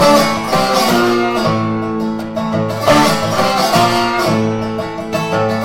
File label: dobro